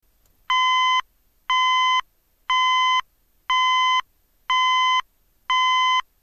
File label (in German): ton, Signalgeraete